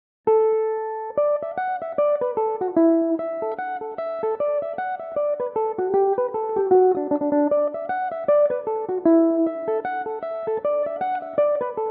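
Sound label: D major belmuse, irish mandolin; boys of dundrum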